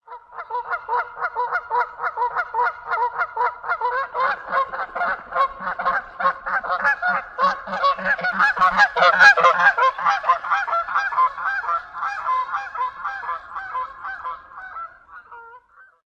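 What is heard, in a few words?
Canada Goose excited pair taking flight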